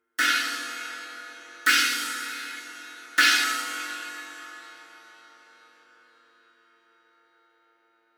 16 I China hits, cymbal

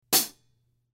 K Custom Hi Hats Closed Hit

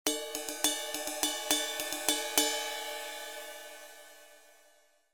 20in Custom Sizzle Ride, cymbal sound

